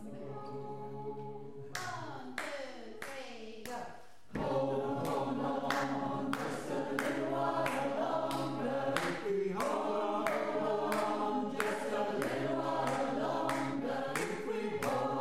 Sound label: Hold On All